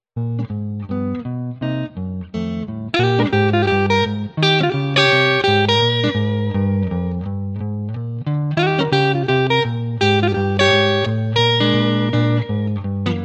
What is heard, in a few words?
Songs For Guitar